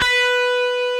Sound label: DIST B4, DIST GUIT